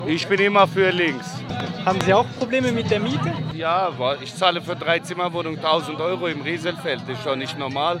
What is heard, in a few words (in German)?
Zuschauer